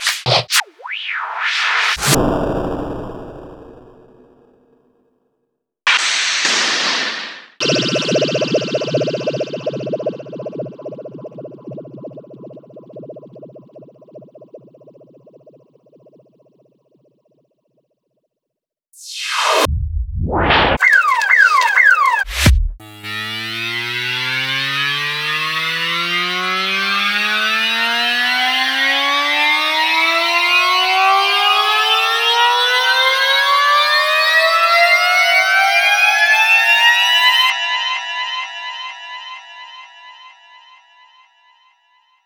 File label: Effects